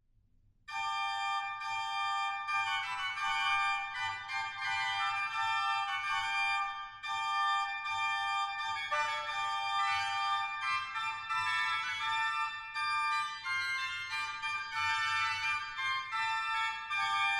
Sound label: Scharff, organ; stade